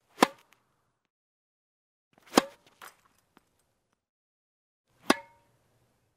udar po mjachu v tennise

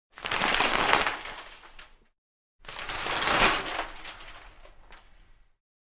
zanaveska v dushe

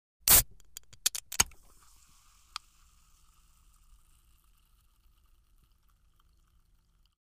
banka gazirovki

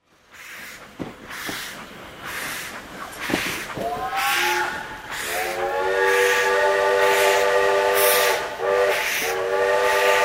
zvuk gudka iz kabiny poezda

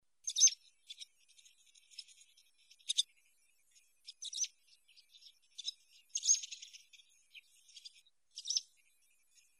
lastochka v gnezde

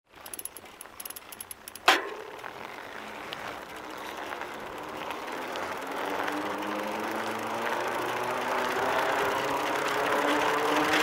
zvuk velosipeda

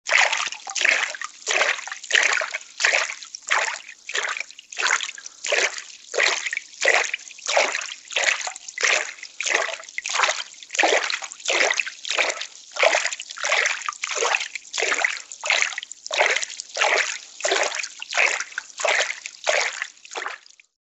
zvuk hljupanja luzhi

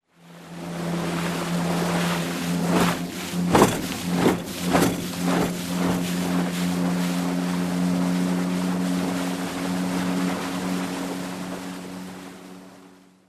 motornaja lodka protiv techenija

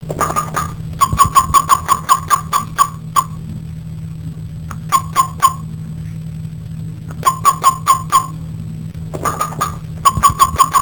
zhaba tajvanskaja

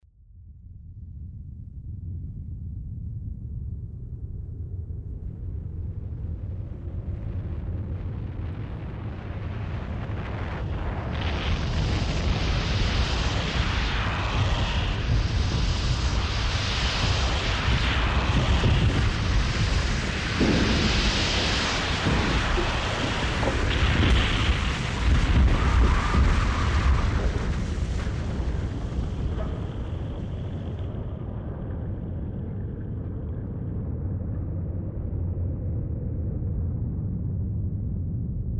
zvuk shoda laviny

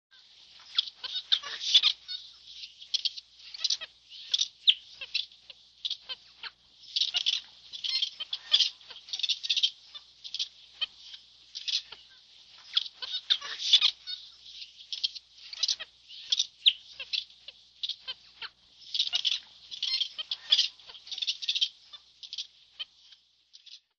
zvuki obezjany

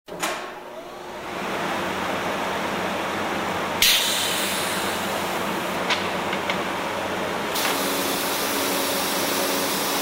obrabotka dereva na tokarnom stanke

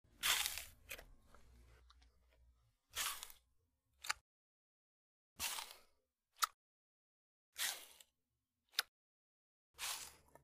poedanie arbuza

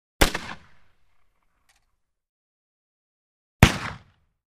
zvuk ruzhja

